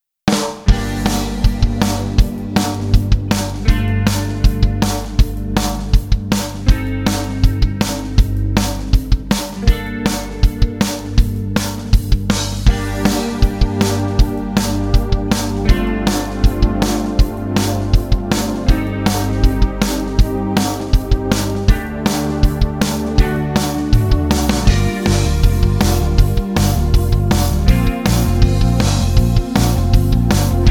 backing

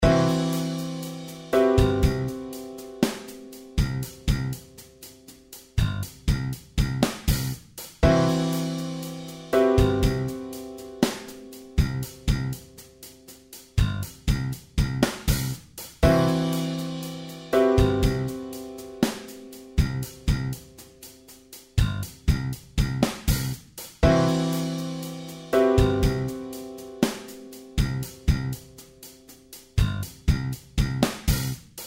Slow 60 Backing track; george stergiou; lick of the week no 18

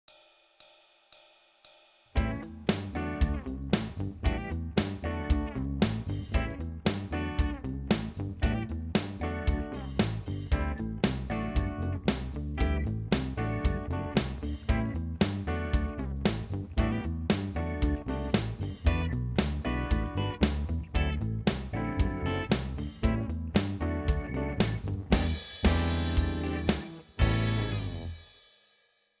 backing, blues guitar

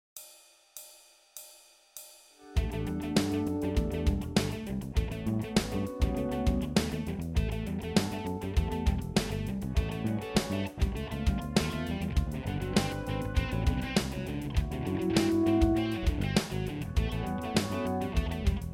backing, blues guitar

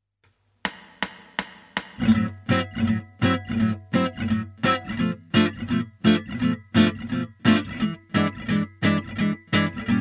gypsy lick backing fast; damjan pejcinoski; lick of the week no 34

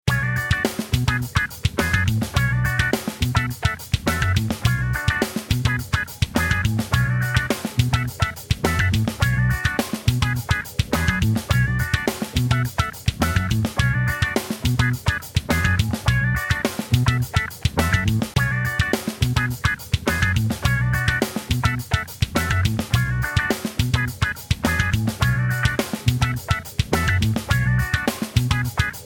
jam track, guitar; tim carter; lick of the week no 26

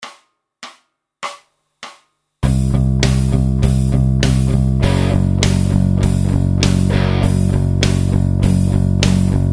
Lick BT slow, guitar; lick of the week no 31

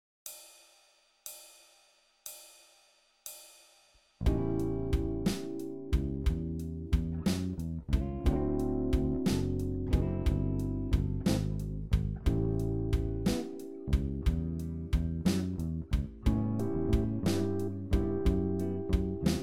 backing, blues guitar

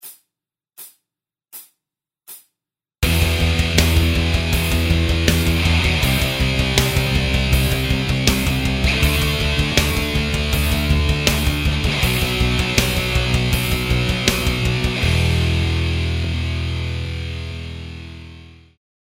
Backing track 4 bars; george stergiou; Creating melodies

